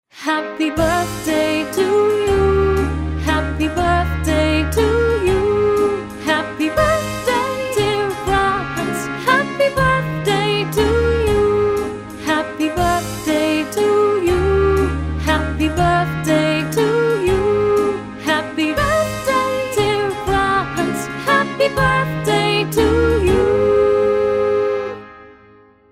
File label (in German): happy birthday franz